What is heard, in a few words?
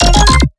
Melodic Bass F 150, One-Shots Bass Hits; Ghosthack; Color Bass Essentials